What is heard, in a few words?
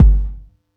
Yamaha EX-5